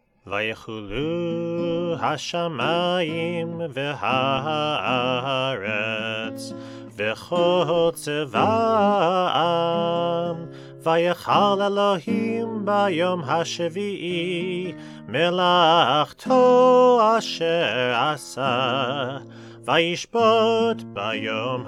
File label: Friday Night Kiddush Vay chulu